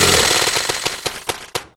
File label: chainsaw wind down; weapons